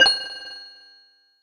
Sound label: Platform Hit; Super Smash Bros